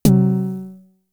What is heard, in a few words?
Snare, Drum Machines; Fricke Schlagzwerg